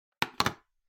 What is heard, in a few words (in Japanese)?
リモコンを置く音